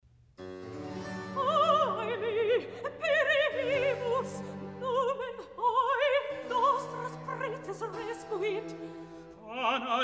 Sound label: Recitativo; Mozart; Apollo et Hyacinthus; Augér, Rolfe-Johnson; Heu me perimus